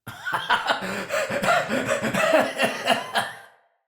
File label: Laugh; Encounters; Medis Gyon